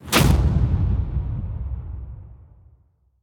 reel long spin stop; Hungry Shark; Wazdan

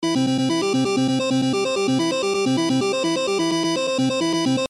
REEL SET START; games; Bananas Go Bahamas DX

